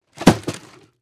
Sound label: Radio Drop, Radio Drop Electronic Device Drop; Drops; Foley; Objects; Blastwave